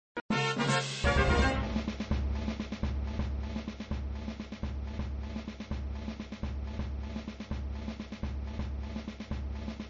Music End of ball music with soft drum rolls (looping), flipper; End of ball music v1 with soft drum rolls (looping)